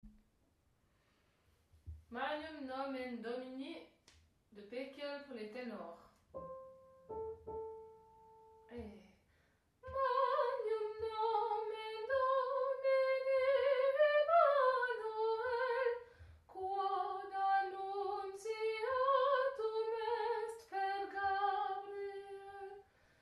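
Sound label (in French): Magnum domini ténor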